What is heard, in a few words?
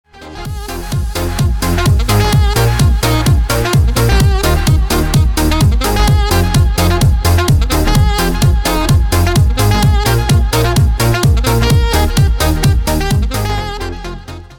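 ringtone synthetic sax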